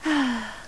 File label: sigh; VEGAS Girls